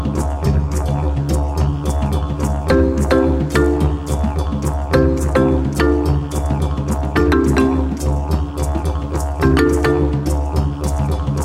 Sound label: breath didgeridoo; Breath Didgeredoo